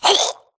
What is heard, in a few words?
grunt death neck; Titanfall 2